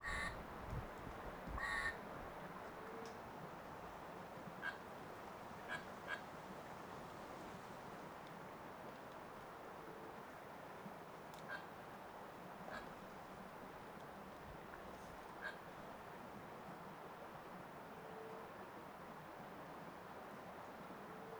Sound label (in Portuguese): Pato Cantando em Beira do Rio; aves